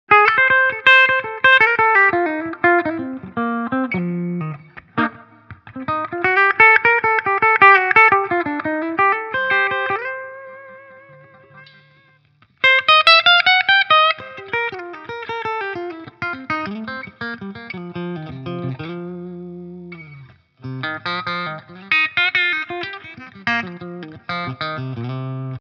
PRS DGT Steg HB SC Clean; PRS David Grissom DGT

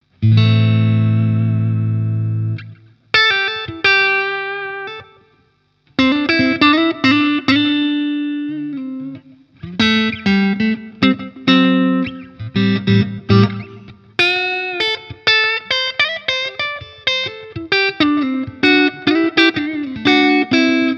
Halstonabnehmer clean

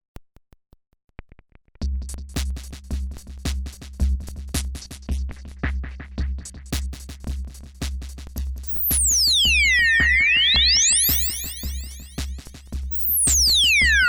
Mini Monsta Ext Drum Pattern Mit Moog Filter

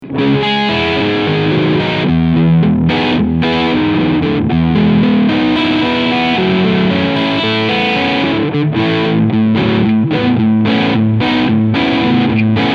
PRS S2 McCarty 594 Overdrive beide PUs Crunch

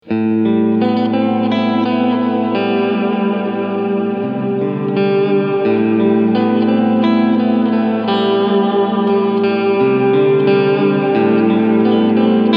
Hall

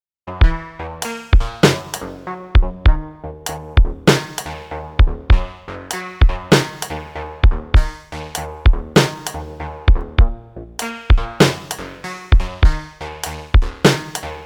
Drumtraks mit Minibrute

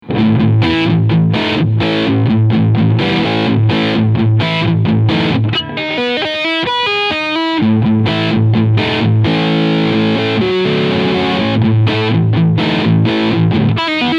Maybach Lester Wild Cherry 59 aged Crunchsound beide Pickups

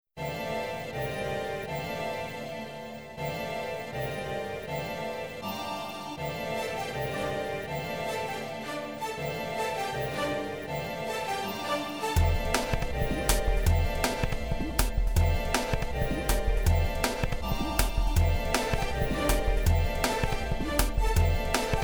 EDM Phase DAW zu Blue

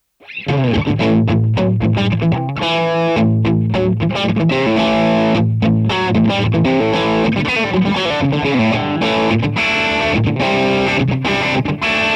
Tele Low Gain MD421 Vergleich

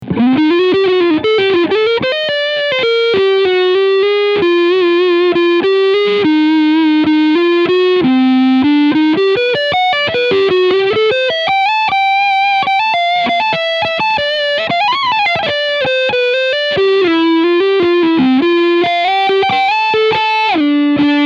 FGN Boundary Iliad Cherry Sunburst Leadsound Front Humbucker

